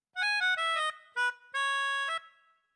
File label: Loading Sting; bluey's quest for the gold pen